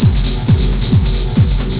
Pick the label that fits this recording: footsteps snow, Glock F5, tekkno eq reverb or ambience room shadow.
tekkno eq reverb